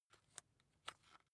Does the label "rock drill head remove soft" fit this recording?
Yes